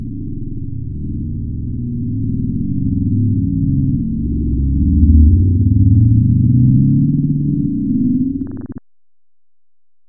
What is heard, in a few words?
bloop